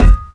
physics cube md; Portal 2

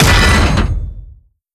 step railing, creatures strider; seq passby

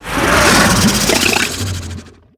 idle out, barnacle; Half Life Alyx

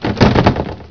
ceiling tile break, plaster; physics

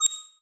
machines crafting ui rollover; combine; Half Life Alyx

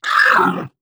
ballbot ow; player; Portal 2

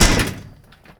land metal grate, footsteps; player

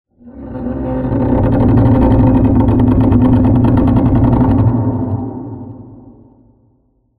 pulse vort oscillation; Half Life Alyx; music; vault

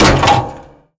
vent step, headcrab reviver, creatures

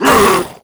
grunt hard, zombie vox; creatures

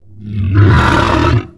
attack growl, ichthyosaur; Half Life 2

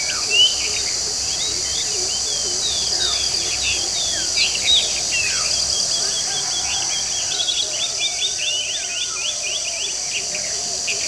shack belize birds; ambient; atmosphere; Counter Strike Model Pack; CS:GO

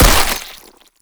break flesh; physics; breaking

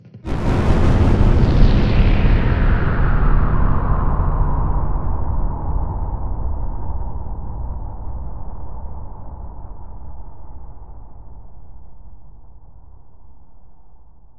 cables blast rs; Half Life Alyx; music; vault

